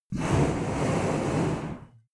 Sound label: reptile breath avarage soft large; kreaturen